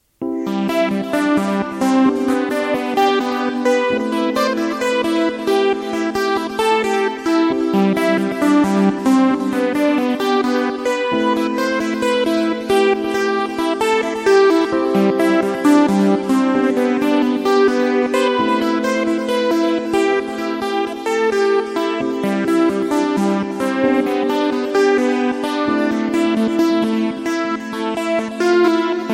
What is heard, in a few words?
ES6 STUPENDO LEAD